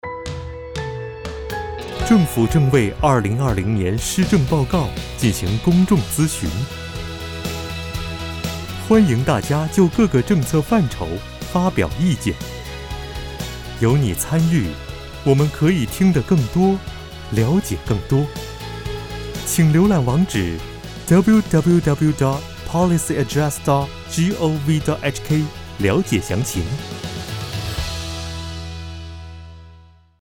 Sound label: Music